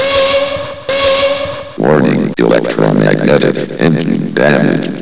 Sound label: engine warn; trempler